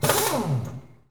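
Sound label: Impact small, air tools, industrial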